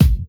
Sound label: shiny hip hop bass drum single hit A key; kick